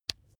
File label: razor attachment remove